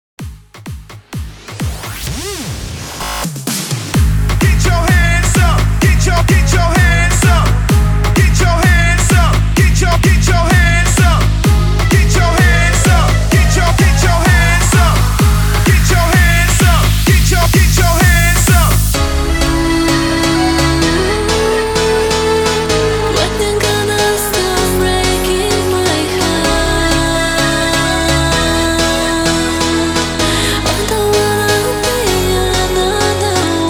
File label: 128 bpm